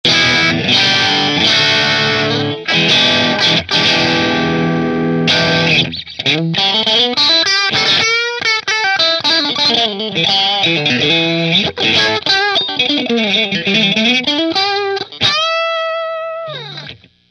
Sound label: Strat Position 1 Through Marshall